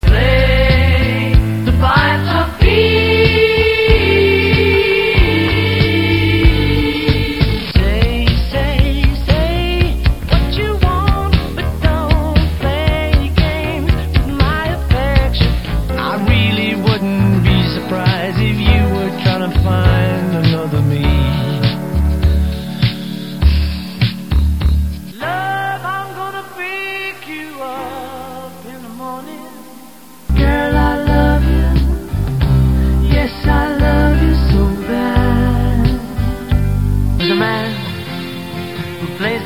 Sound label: pipes